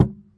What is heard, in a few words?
chassis hit, Typewriter Kit